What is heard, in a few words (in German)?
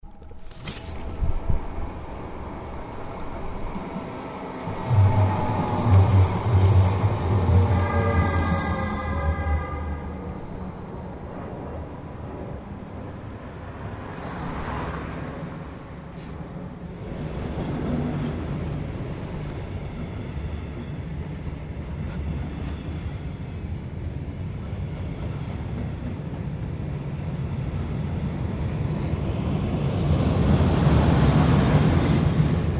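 Bahnhofs Atmo Züge Einfahrt Ausfahrt; Ffm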